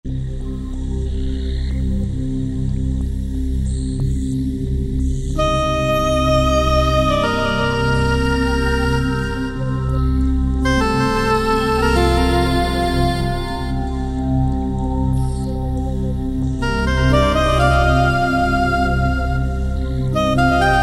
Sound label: Dream Sequence, Korg TR-Rack